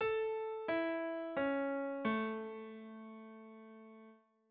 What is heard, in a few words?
Major Arpeggio A2 A3 1 octave descending only